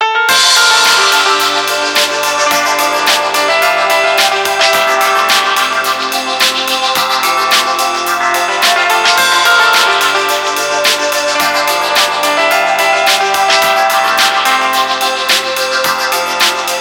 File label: ringtones; SK telesys SK-S100; InSilence